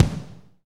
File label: KIK AMB, kicks; AMG; Now CD-ROM (Roland); NOW! Drums